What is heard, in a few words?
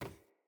Ladder Climb Wood